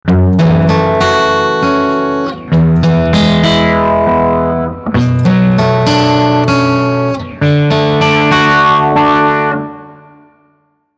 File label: Guitar Filtrator; NR Plus